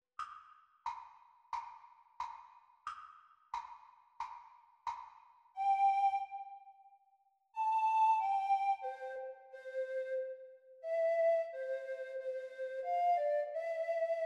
Treble Recorder; Grade 3; practise speed

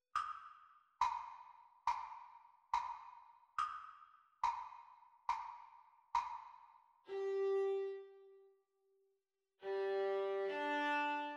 Viola Duet Playalong Practice Speed

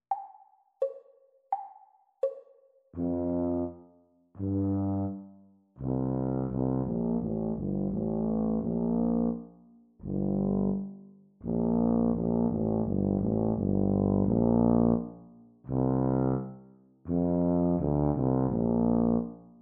Bb Tuba Duet exam speed; Grade 2

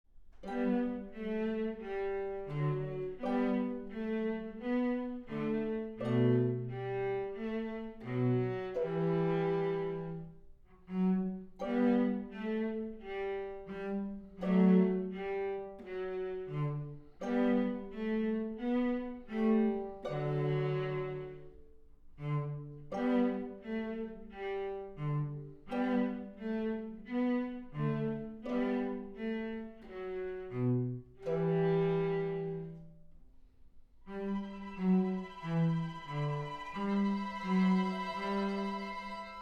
Down the Winding stairs without violin

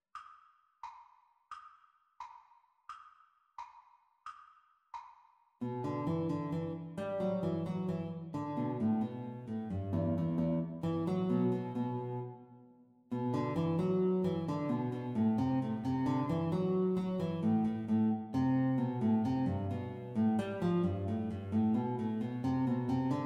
Guitar Duet Playalong Exam Speed

